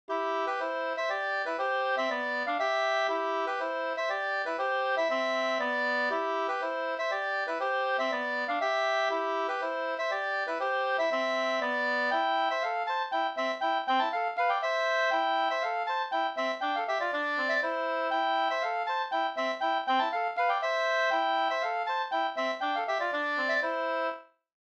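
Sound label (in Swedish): polska, tvåstämmig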